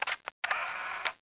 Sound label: Shutter